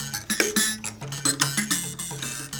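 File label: PLK Bass Mbira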